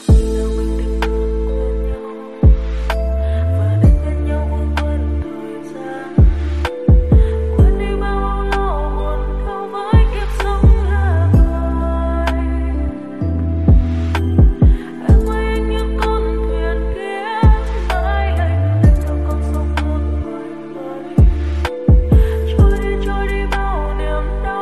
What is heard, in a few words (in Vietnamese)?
Nhac Chuong Lofi Ver